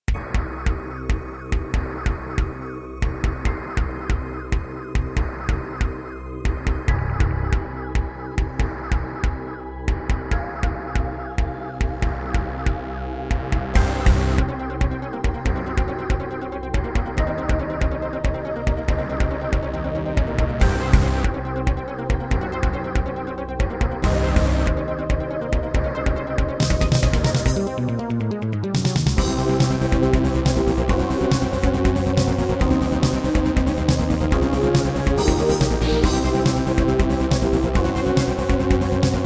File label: Yamaha QY70; Doom (Sigil)